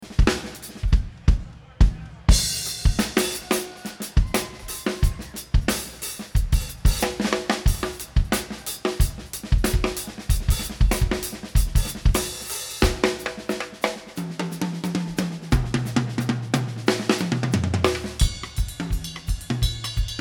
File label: Drums